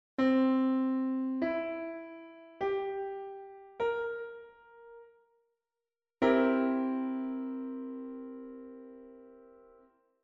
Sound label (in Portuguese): acorde C7